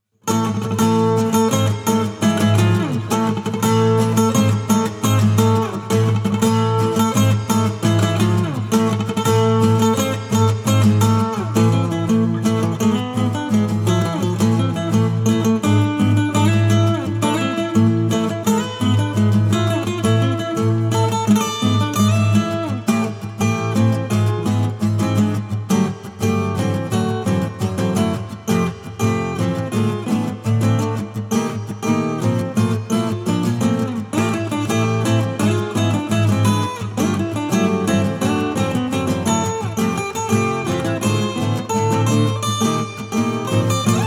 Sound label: Acoustic; Egg Dragoon